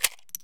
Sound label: ammo unload; Escape from Tarkov; ambush